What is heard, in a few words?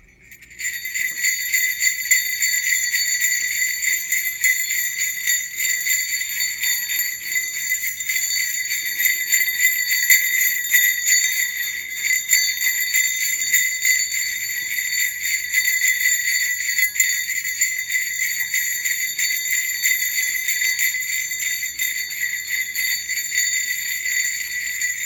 long bells